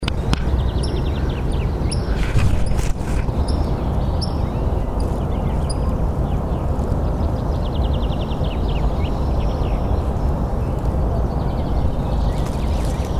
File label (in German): seegeraeusche